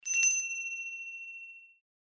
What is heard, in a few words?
cloche fin; Compétition Escrime artistique